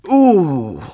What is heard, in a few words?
OOOHHH